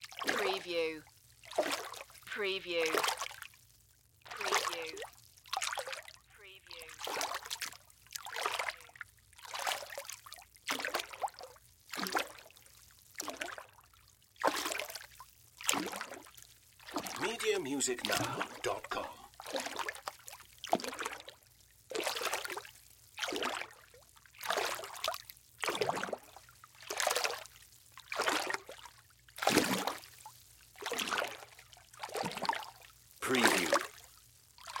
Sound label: Water Walk Wade Slow; Sound Effects Bible